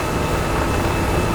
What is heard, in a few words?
Star Base Blast Door Loop